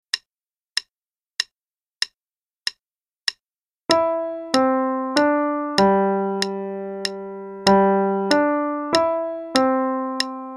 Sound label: Clock Tower Bells (qn=96); Adult Level 1 - page 27